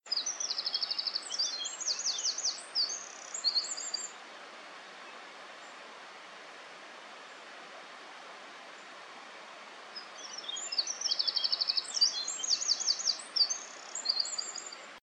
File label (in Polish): strzyzyk, ptaki